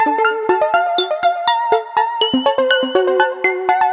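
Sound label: 122 BPM Sequence A major G minor Tonic F; innovative sequences 1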